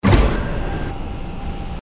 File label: hydraulic blast